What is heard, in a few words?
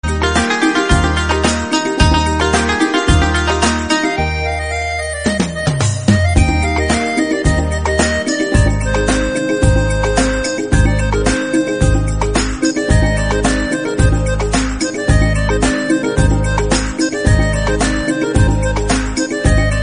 instrumental bgm tone